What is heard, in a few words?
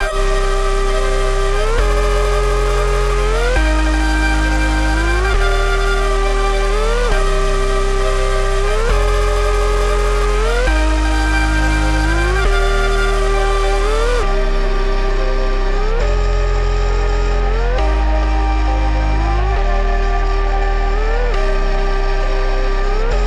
135 BPM C Min, Melodies; Pyro; Cymatics - Immortal Production Suite Beta